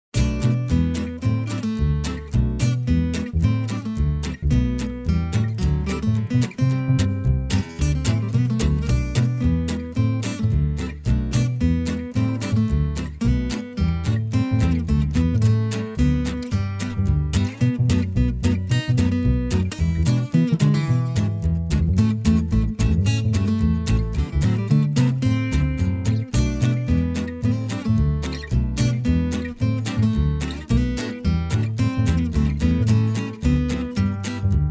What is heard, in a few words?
Sweet Lima Beat